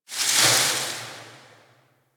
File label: Tower Branch Disturbance; Warframe; Quests; TheNewWar; Ambience; Cetus; Unum Tower